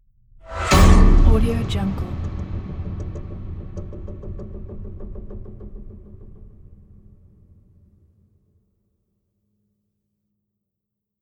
horror piano texture impact

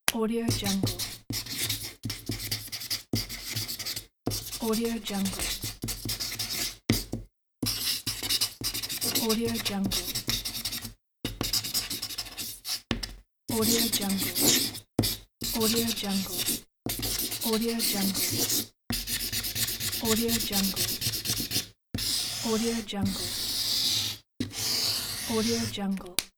marker

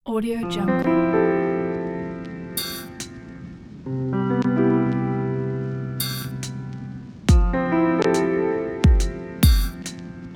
technology lofi jazz

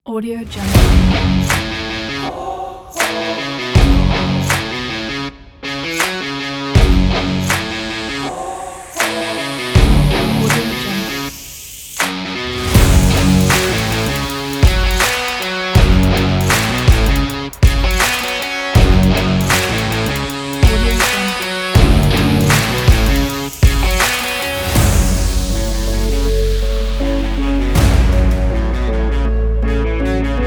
rock trailer energy

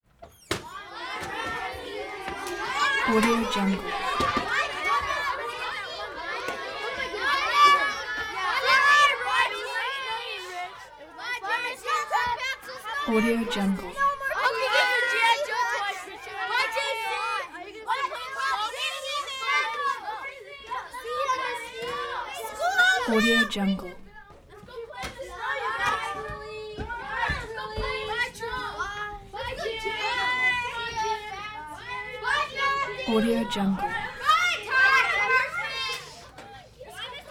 screaming people female and male scared help

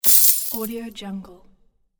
buy coins; gamechestaudio

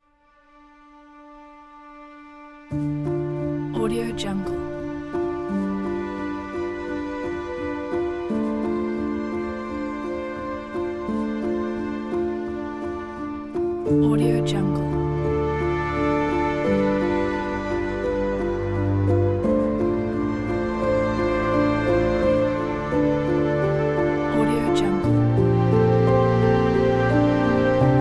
fantasy mysterious romantic strings

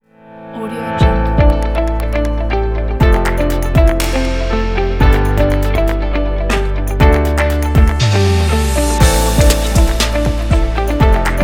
corporate technology intro logo

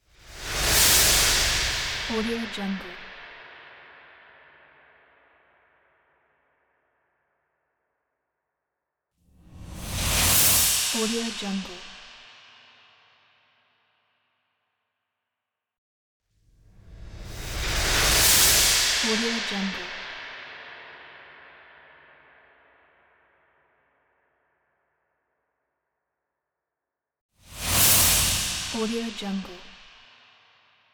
cymbal whoosh; kaelv

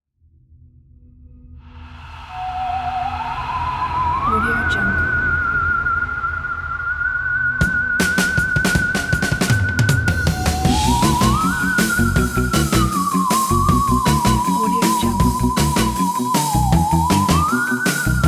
vintage halloween fun; thatbeat

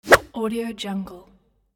whoosh and punch

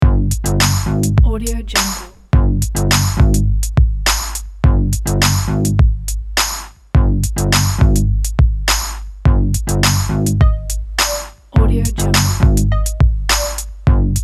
urban game loop

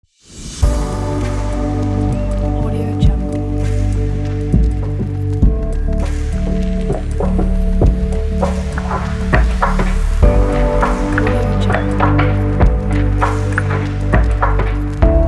elegant ambient logo ident

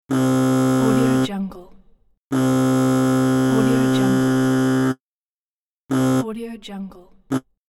wrong answer buzzer; sfxforyou

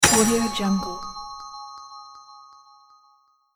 reveal magic explosion

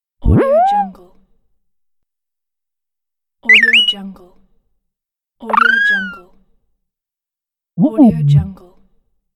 game creature fun

